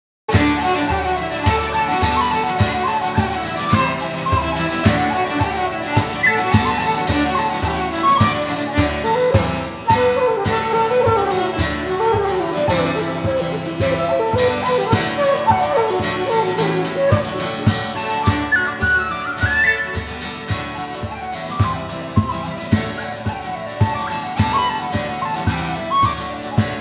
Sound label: Pub Flute